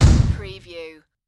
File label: Magic Poof Flash